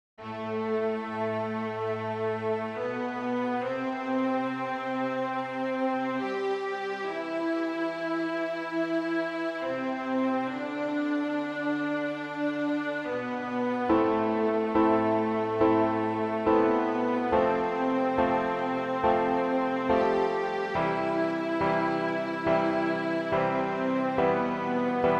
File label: piano cover